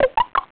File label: Pops